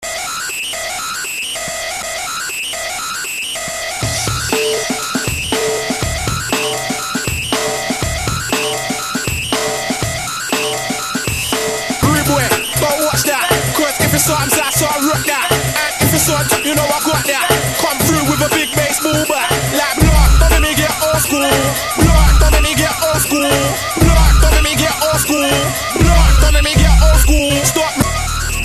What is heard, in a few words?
annoying beats